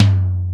low mid tom sound g key, tom tuned to the G note. Loudest frequency: 482Hz; tom drum samples